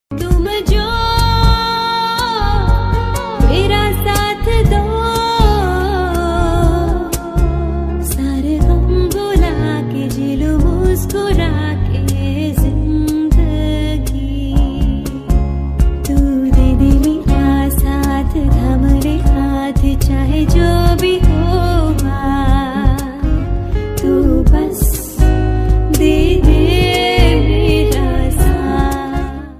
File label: female hindi ringtone